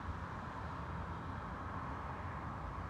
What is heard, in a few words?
Ambi Loop, outdoor samples Ambience Loops